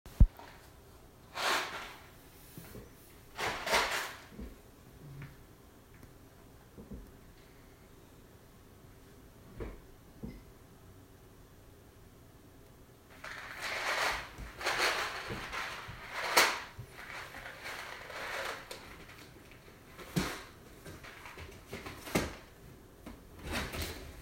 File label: Pantry